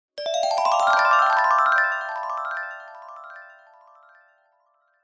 fantasy magic glissando bright mallet style magical burst ascending